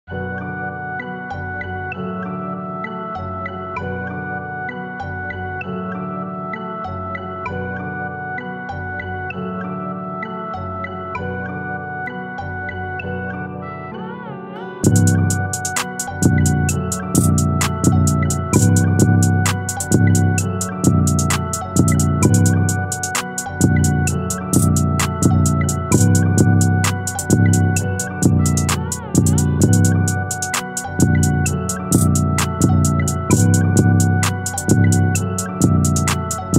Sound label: instrumental; Prod JBC